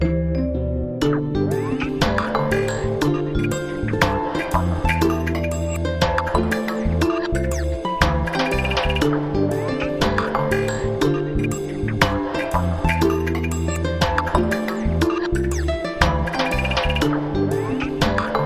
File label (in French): Marche Guit